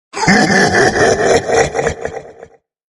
Bowser Laugh, One of Bowser's voice clips; Skylanders

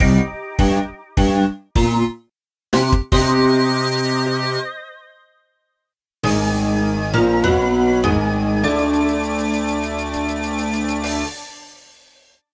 Warped Mansion Boss Victory

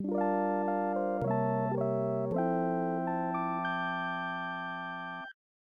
Game Over, Song; SMW

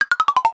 Dry Bone Stomp

